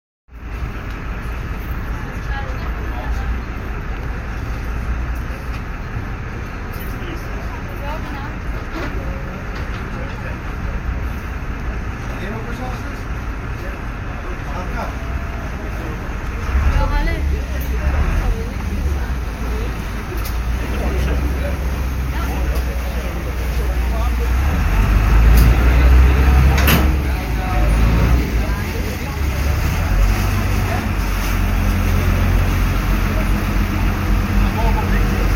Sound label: Grand Canal Venice